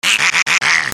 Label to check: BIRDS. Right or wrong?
Right